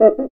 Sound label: CUICA; ILIO